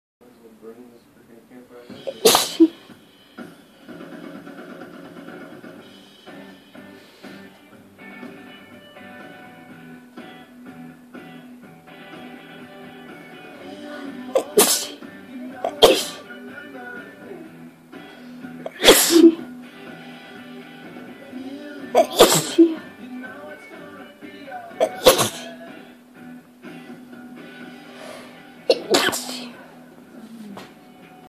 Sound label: tickly, female